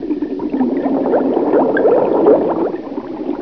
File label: potion; quake; fortress; haunted